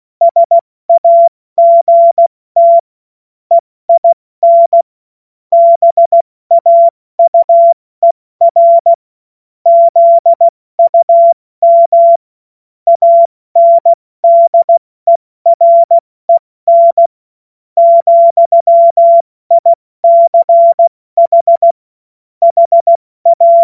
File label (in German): Witz, cw morsen; Tempo 70 F80